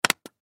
Button Switch; Multimedia Web Interactive; Buttons (Sound Effects:Multimedia; Blastwave; Technology

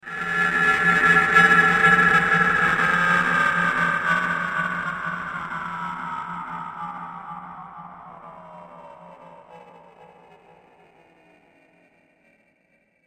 Strange Slow Motion Falling; Miscellaneous Cartoon Sound Effects; Andrew Fleming

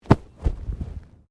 Body movement Tumble Medium

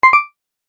Tap Select, button; multimedia sound effects

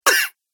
Cough Cartoon Game Voice; Cartoon Voice Sound Effects

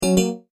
Multimedia Tone-Stop

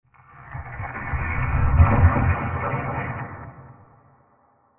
Science Fiction Spaceship Flyby Transition; Science Fiction UFO Transition With Extrem Left To Right Panning; CraftedSignals

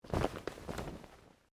Clothing Shirt Cotton Move; Movemement sounds for various articles of clothing; Dressing; Human

